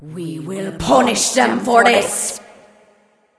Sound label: death; smite